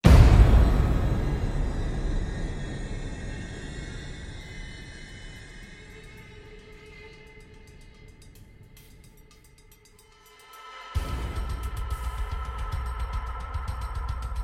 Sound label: drums fx